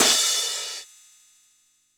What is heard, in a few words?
Cymbals crash; Vengeance Samples